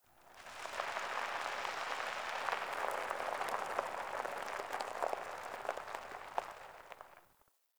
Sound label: Tire On Cement One Off; Warframe; Ambience; Nineteen Ninety Nine